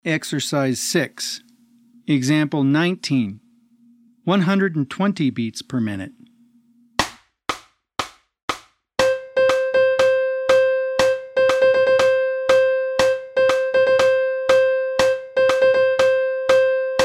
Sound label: 120 BPM